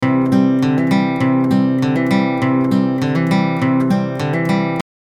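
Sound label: acoustic guitar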